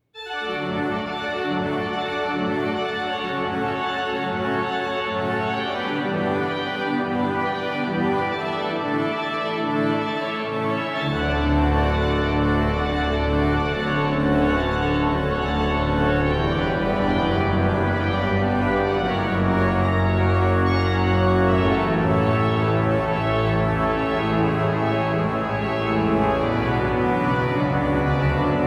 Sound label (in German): Orgelimprovisationen Toccata; Toccata über Himmel, Erde, Luft und Meer (EG 504)